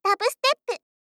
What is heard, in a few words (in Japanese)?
ダブステップ